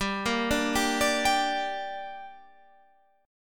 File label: G Minor G, Guitar Standard; arpeggiated; JGuitar